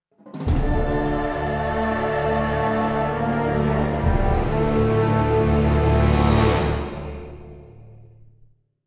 Game Over; Dungeons & Dragons Tactics; PSP

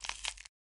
Grab Bone; lethal company

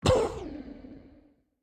Cough

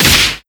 Ball Hit Drive; gamerip

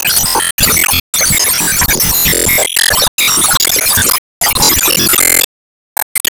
Gacha Card Get Special; disney star smash android ios mobile gamerip 2020

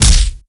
Enemy Take Hit

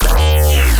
Bass Growl 150 D#; exciplex sample presets pack; JinoBeats

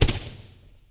fireworks; Skylanders Cloud Patrol; Arcade, iOS, Mobile

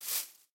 Grass; Minecraft: New Nintendo 3DS Edition

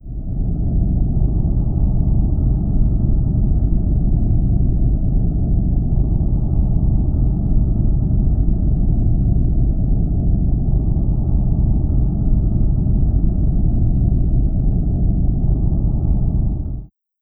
California Rumble

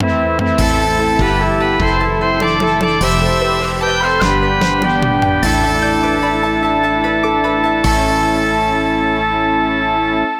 Final Stage Complete (LA)

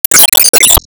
Sliding

